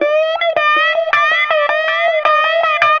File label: Delay Guitar